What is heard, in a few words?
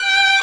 violin